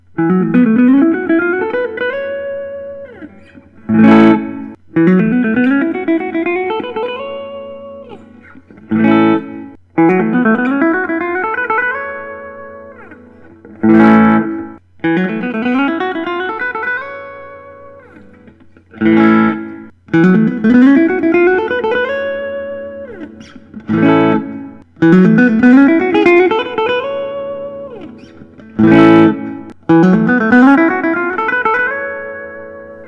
parker fly deluxe black, Guitars Electric Solid Body